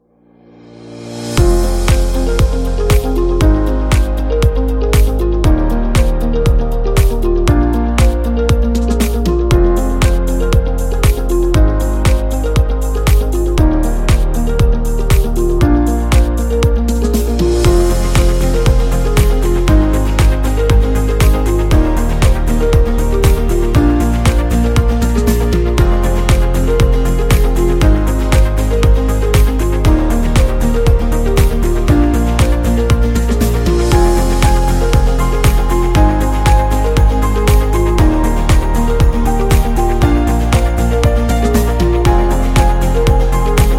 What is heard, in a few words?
Motivational Corporate; corporate beat